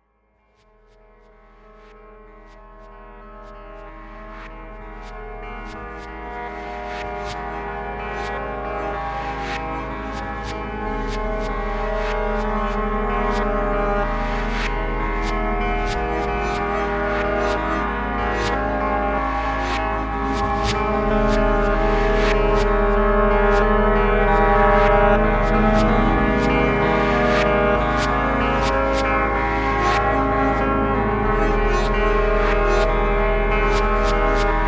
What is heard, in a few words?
drone; Still Alice; Tones, Textures, Underscores